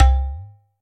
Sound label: drum tabla indian percussion